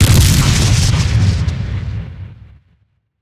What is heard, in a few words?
Banshee Missile Impact; Evolve; Elder Kraken